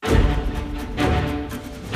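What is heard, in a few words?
music dialog determined intro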